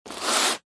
industrial sanding large piece of wood sand paper single